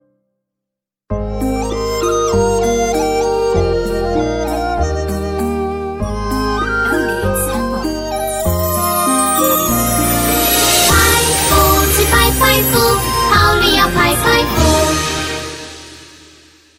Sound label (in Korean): 중국여 노래; 팬더파이 춘절